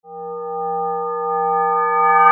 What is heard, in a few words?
BELLS